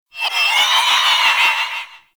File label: Sticky Ink Vocal Call; Gameplay; Mutator; Warframe; Shadowgrapher